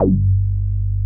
MOOG G1, ANALOG BASS; Music Store - Claudius Diemeter Schwerlos

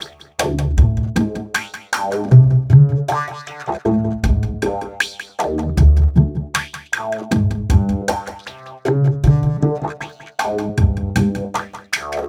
Remixed Acoustic Bass

